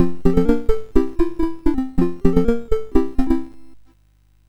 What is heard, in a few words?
sid MOS6581R2